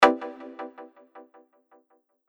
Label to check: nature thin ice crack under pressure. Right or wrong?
Wrong